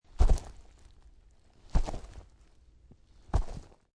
Dinosaur Swamp, Jurassic creature or large beast, taking three heavy footsteps; on slightly wet surface; Monsters